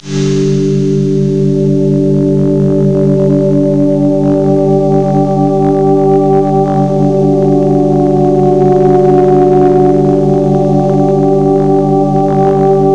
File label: new age; ClickArt 300,000